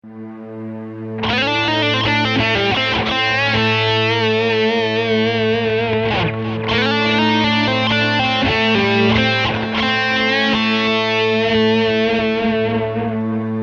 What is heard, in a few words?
Lick